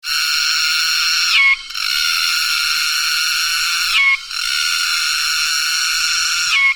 Dundubia vaginata

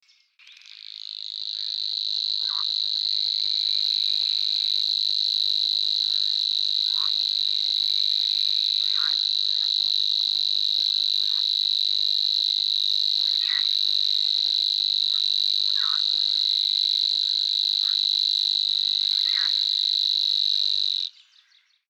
Locustella luscinoides

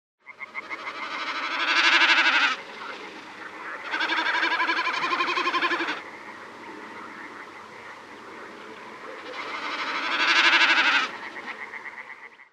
Gallinago gallinago

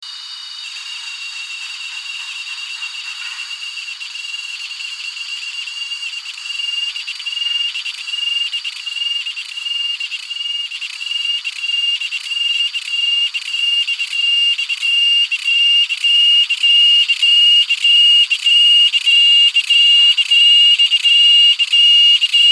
Chremistica pontianaka

